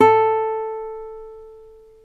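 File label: A3 CLASSIC, CLASSICAL GUITAR; Northstar - New Gold VOL-2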